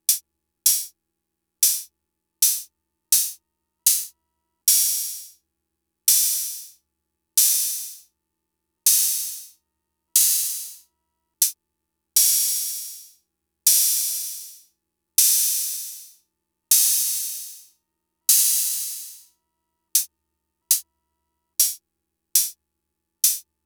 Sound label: Fricke MFB-522 OPEN HATS